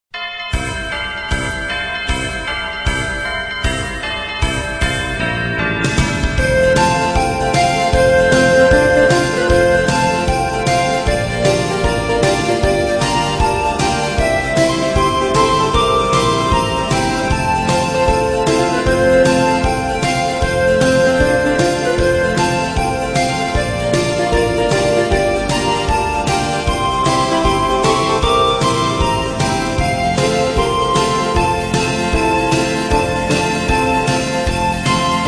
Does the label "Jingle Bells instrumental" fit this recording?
Yes